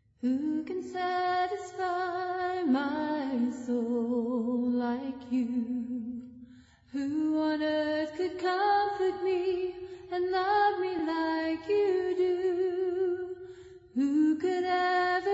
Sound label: a capella hymn, who can satisfy